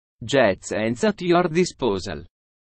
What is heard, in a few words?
jazz